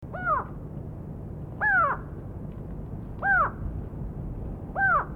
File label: corythaixoides concolor; musophagidae; calls